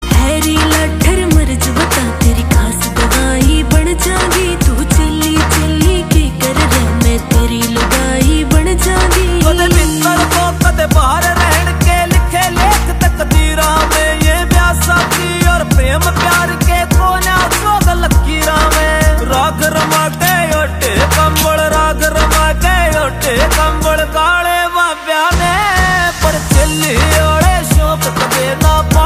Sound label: Ringtone